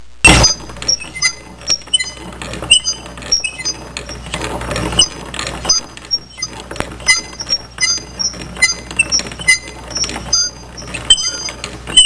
Squeaky Cranking

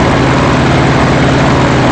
ENGINE

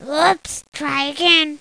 bummer

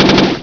Triple Shot; Spectre Supreme Demo

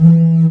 rally delivery beam

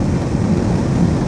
flame; PC Gamer IT CD 45; SEED demo; hero

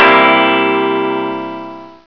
Guitar; Sound Library 2000; Wayzata Technology

